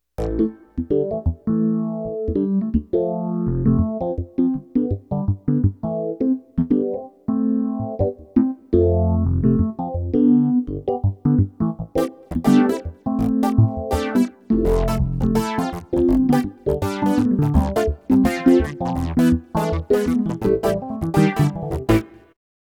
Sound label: Groove Synth Dry